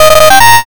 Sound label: fanfare